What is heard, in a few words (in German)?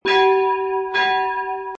glocke as2